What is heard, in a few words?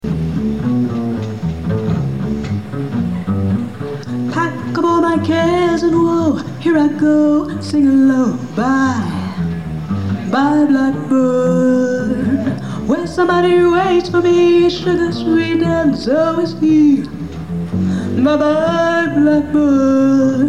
LIVE